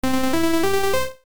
Battle Win; Space Invaders The Original Game; TurboGrafx-16; Kawaii

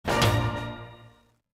Jingle Message

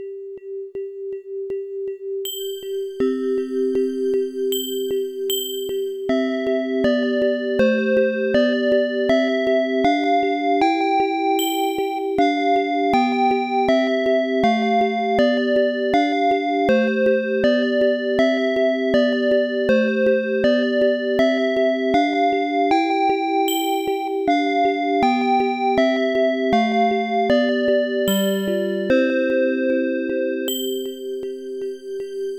Humming Song; AdLib music synthesizer card demo songs; IBM PC XT AT

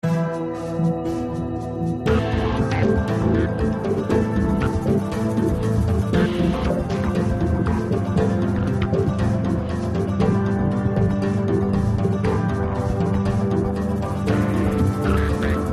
Loop Tense; tales from the borderlands gamerip 2015

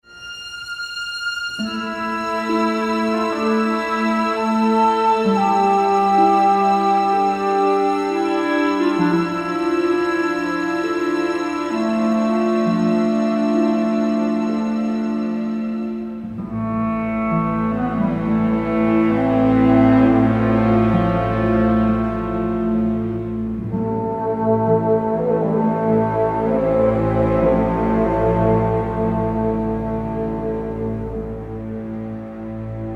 Field BGM; Xenoblade Chronicles 3

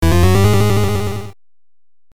Home Menu Banner; Witch and Hero; 3DS

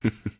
Mask Laugh; Lethal Company

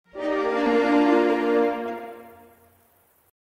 Push Jingle; Asterix at the Olympic Games

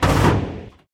Big Door Close; Lethal Company

